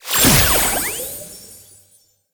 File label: Pickup Despawn; Warframe; Nokko Colony; Find Nokkos